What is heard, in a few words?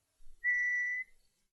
nightparrot Long Hollow Whistle; Nick Leseberg